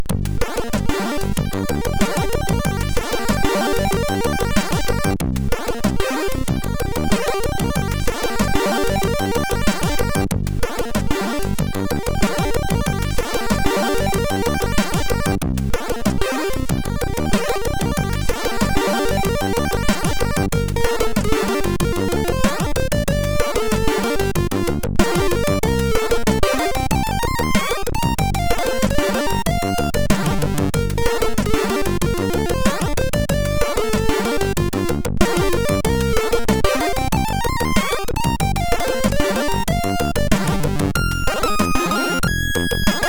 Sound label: Musique, MOS6581R4